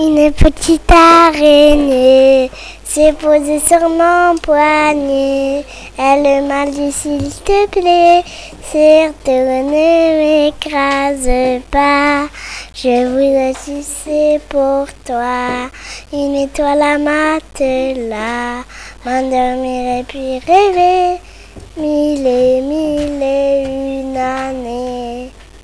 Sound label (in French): chant